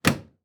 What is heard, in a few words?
Transportation Car Ford Model A Open Door Exterior